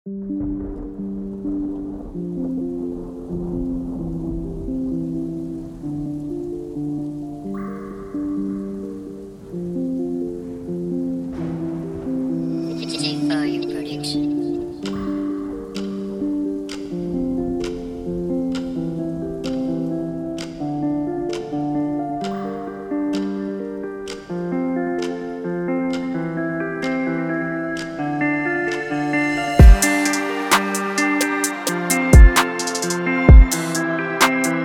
URBAN

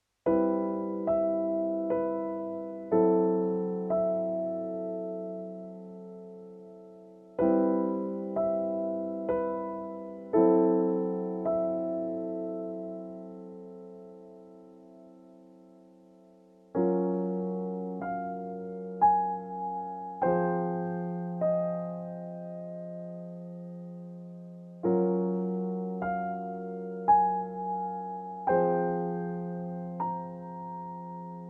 PIANO

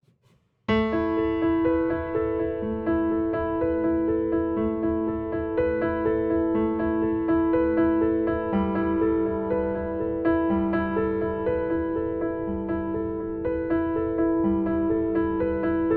PIANO

